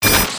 medi shield burn, weapons; tf2

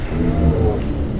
call, giraffe; zoochosis pack1

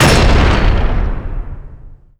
portcullis slam; ambient; Garry's Mod; tf2; rottenburg

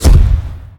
para open; Garry's Mod; tf2

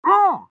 pain sharp; pyro; Garry's Mod; tf2 content

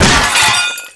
robo impact hard; physics; Garry's Mod; tf2; mvm

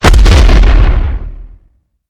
step; poppy; catnap dragon

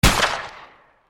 shoot; kawitto banditto; kiwito bandito

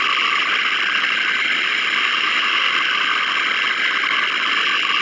data transmission loop; ambient; Garry's Mod

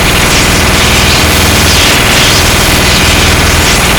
minigun shoot crit; tf2

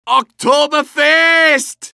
cheers; medic

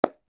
clap; pyro

